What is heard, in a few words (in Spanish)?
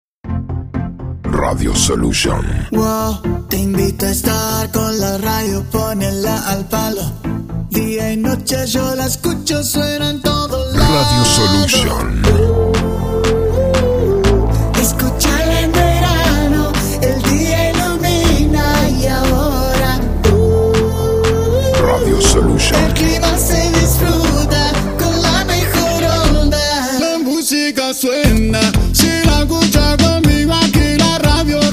jingle verano